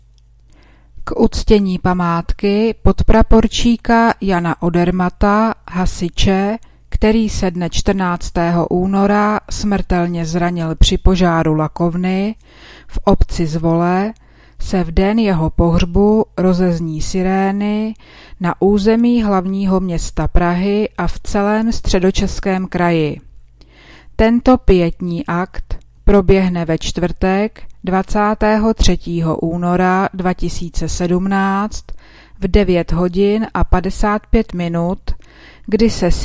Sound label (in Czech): sirena